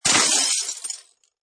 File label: Break Glass, Medium glass break crash sound. Large number of small glass fragm